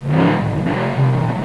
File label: Race; Racing Days R DEMO